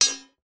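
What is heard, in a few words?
warfare sword hit another fight; zapsplat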